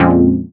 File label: stab jazz F; stabs